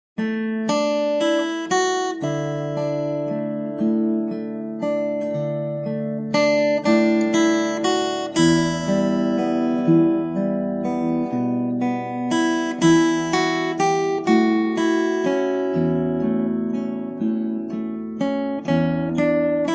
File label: stewball fingerstyle, guitar